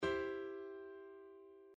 cメジャーコード 第一転回形

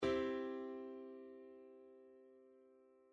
cメジャー コード

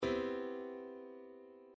cメジャー7 第3転回形

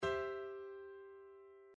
cメジャーコード 第二転回形

